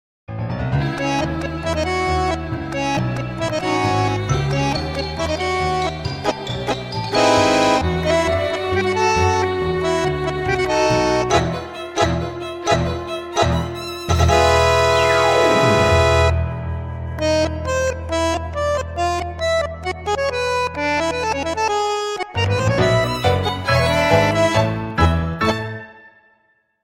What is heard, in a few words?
Nokia Tango